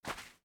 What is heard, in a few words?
Footstep Sand